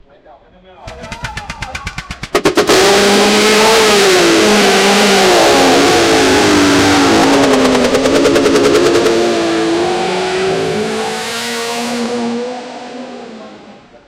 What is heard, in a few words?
race engine firework